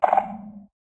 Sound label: warden tendril clicks; minecraft 1.21.4; mob